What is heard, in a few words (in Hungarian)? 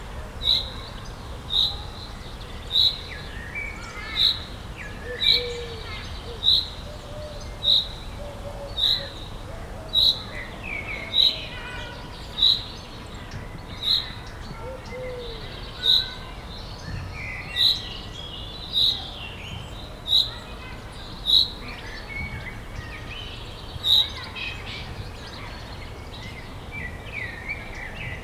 a játszótér közelében, tücsökmadár; jaszberenyi zoo 2016